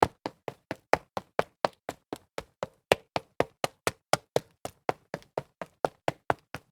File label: sneaker stairs up run concrete, foley